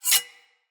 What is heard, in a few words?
Sword Slice Accent; Weapons; Garren Sword